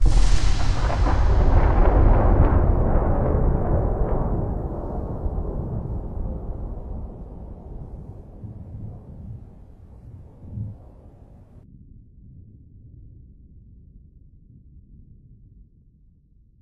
vortex flash

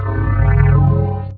refresh

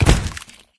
thump

